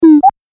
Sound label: Pickup App Game; Video Game Sound Effects